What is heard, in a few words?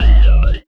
808 Bass, Fx; Sonic Foundry (Sony Creative Software); Esoterik Beatz